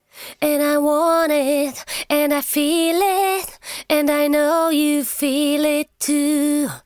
vocals - Smooth Vocal Compressor; Transient shaper audio effect plugin; Crunchessor

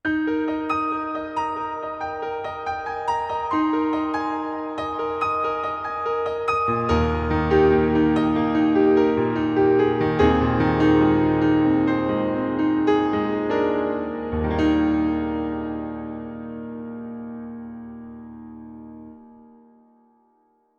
Acoustic Piano - Grand Hall; OldSkoolVerb

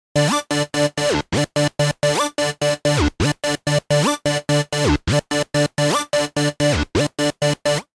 Synth Arp - Rez Synth Pad; Shumovick

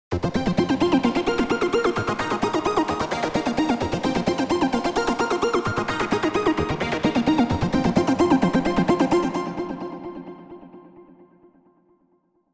Arp Synth - Smooth Reverb; OldSkoolVerb

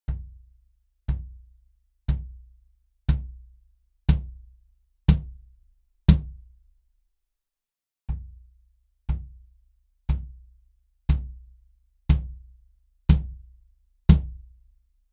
Bass Drum 22 - Felt Beater

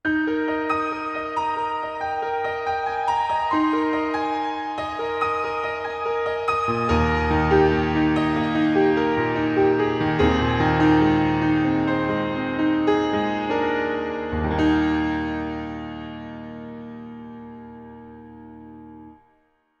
Reverb - Piano - Sizzle; CRTIV Reverb

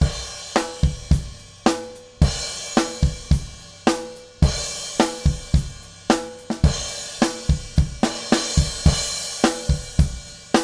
Acoustic Drums - Windy Pad; CRTIV Shumovick

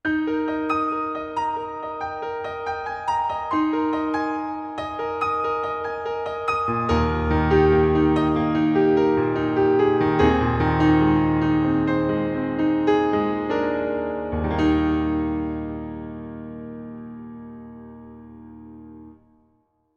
Acoustic Piano - Smooth Reverb; OldSkoolVerb

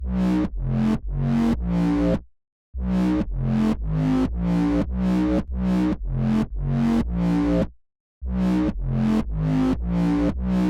Bass - Overdrive; Shinechilla; Reason Bass

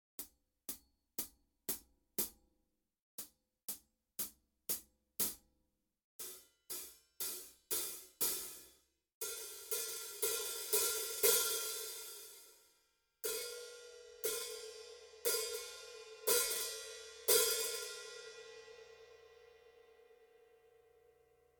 High Hat 14 - Plastic Rutes

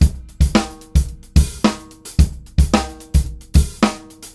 110BPM Bridge

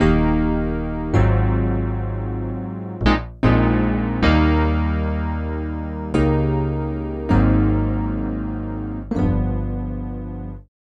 Chorus - Electric Piano; CRTIV Chorus

